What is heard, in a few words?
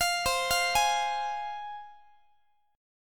C Suspended 4th Sharp 5th C, Guitar; Csus4#5 arpeggiated; x,x,20,22,21,19